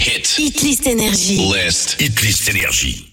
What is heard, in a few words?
Acap; NRJ